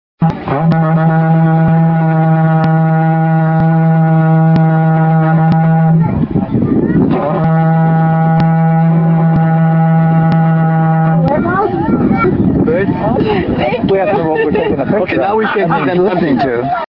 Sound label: Horn, Africa